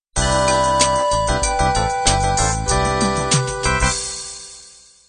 16 beat soul end

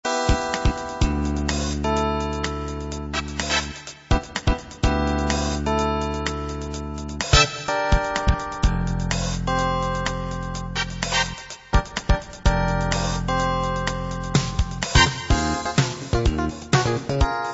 jazz rock

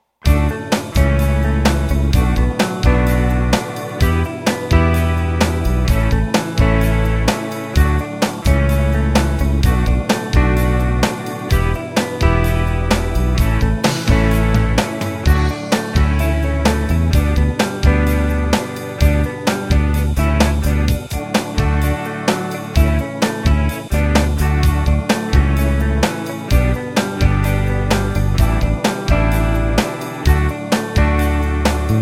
8beat rock

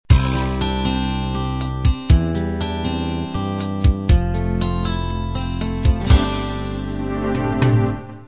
pop beat intro

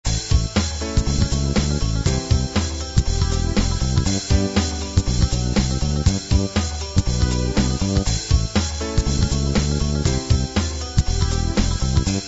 Acid Jazz

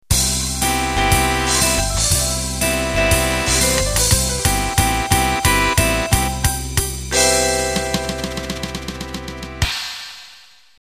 Cool Jazz End

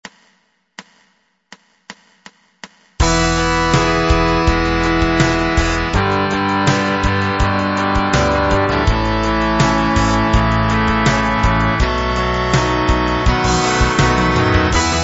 half time rock

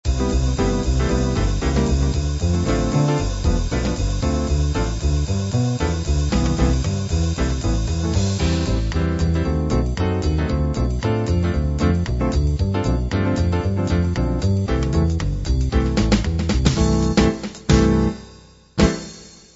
bop

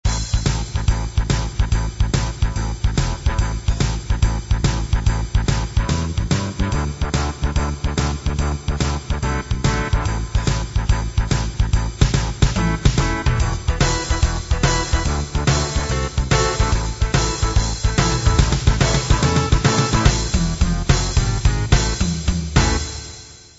hard rock

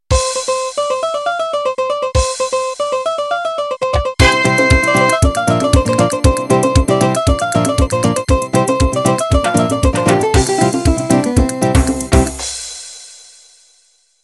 Gypsy Pop Intro

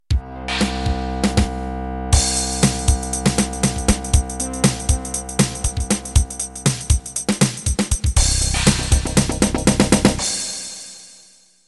Jungle intro

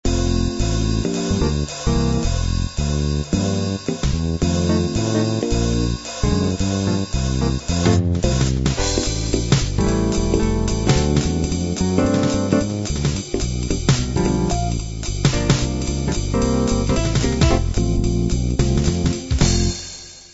bop

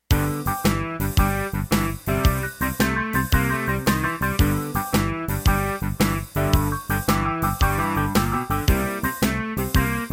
12/8 rock

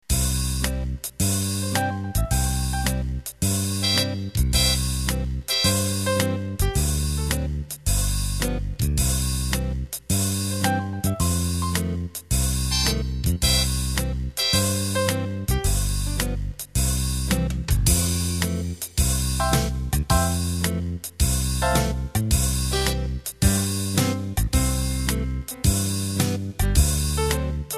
Fox Trot; Acoustic Piano, Nylon Guitar and Muted Brass

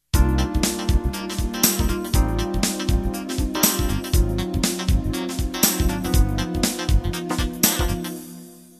Groove Intro

